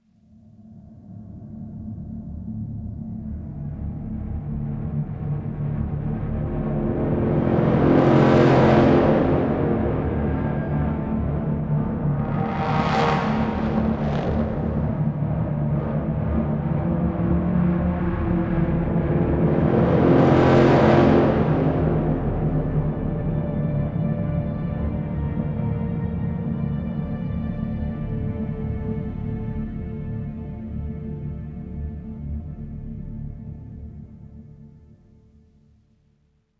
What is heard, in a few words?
Env Residential; The Dark Knight Rises; Android, iOS